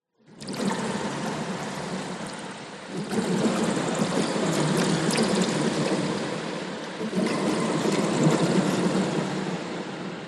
science fiction alien planet weird watery bubbles movements